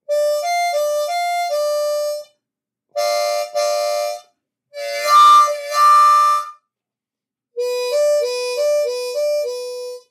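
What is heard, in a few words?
Train Imitation, harp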